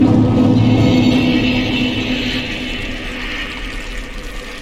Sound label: new area; Dark Souls III